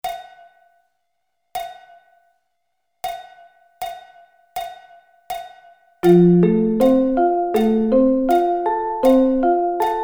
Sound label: F Arpeggio Inversions